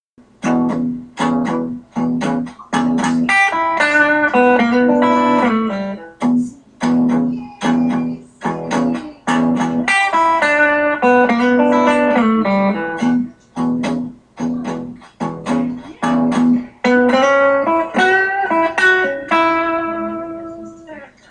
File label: two good blues licks